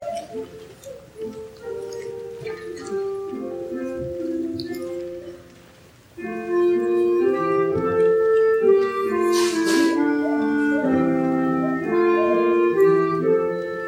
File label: clarinet